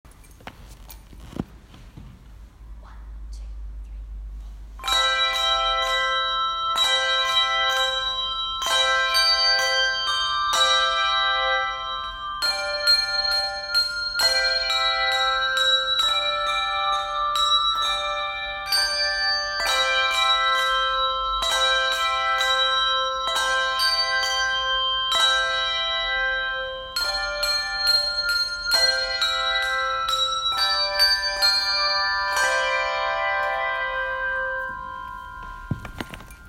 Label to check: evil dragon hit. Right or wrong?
Wrong